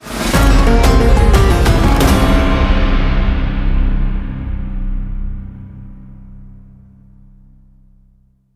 Jackpot Outro Splash; mercy of the gods

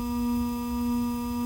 feature Buy Loop Oct Up; hotline2

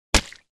lucky mug fall down; finn

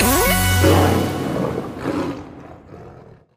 fs Symbol Lion; neon staxx

